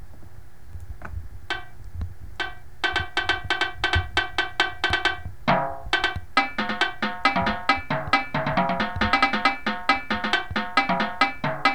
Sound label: tenors, drumline